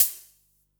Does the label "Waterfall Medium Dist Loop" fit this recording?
No